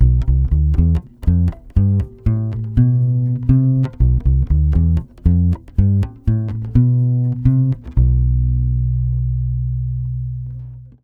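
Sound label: 120 bass B diminished, brazil bossa; USB Soundscan; AKAI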